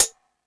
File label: Beat Box; E-MU Pro-Cussion